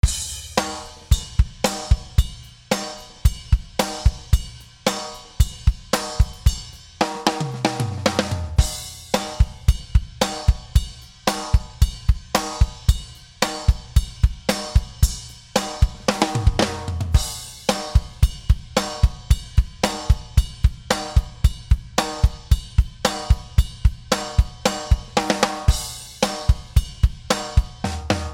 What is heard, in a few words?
Ride Along Acoustic Drums